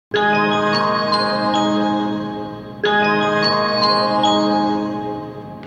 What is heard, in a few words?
Intercom